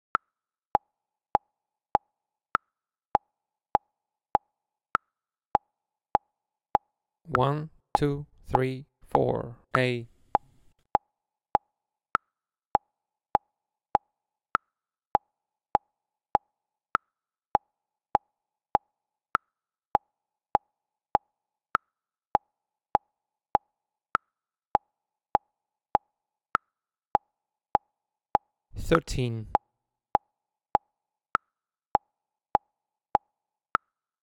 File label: CLICK+CONDUCT